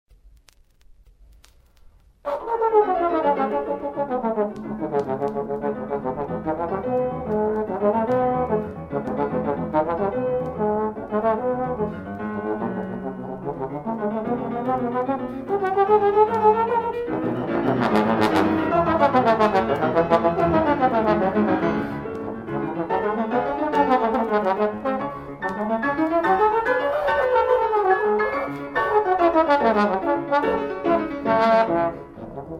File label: trombone; schumann symphonic etude 10